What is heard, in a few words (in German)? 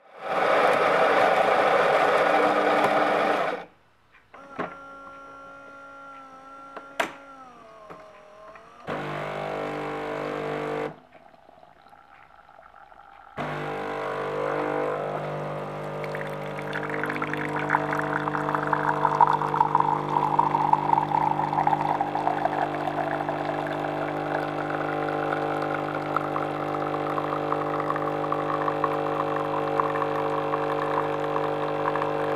Kaffeemaschine